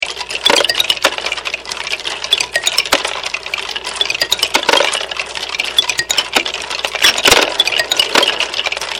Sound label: machine; marbles